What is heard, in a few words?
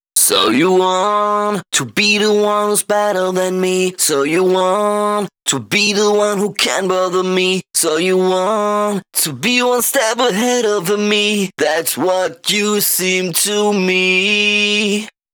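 Pitched Vocals Verse 1 DRY, 128 BPM; Vengeance Samples; VTDS2 Song Kits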